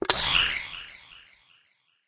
Strongbull Take; Farm Mania

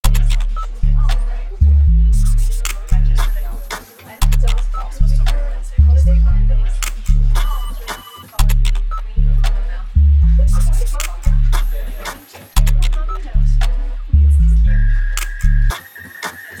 Career (115 Bpm)

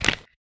Break Bone Light; Osteotic Bypass

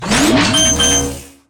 Stinger Pickup Health Stereo; Infinitum

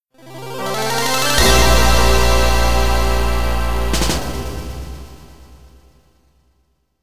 stinger; high strangeness; wii u

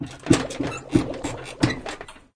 Sfx - Well

